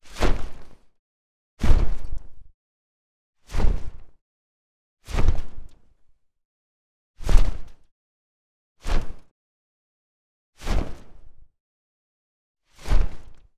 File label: Cloth Frump; Poetry Project